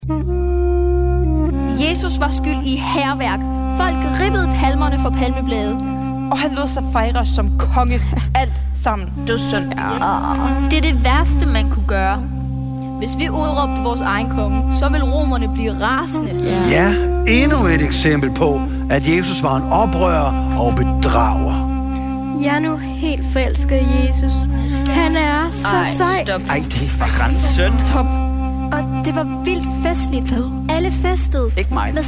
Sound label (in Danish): Drama musik og drama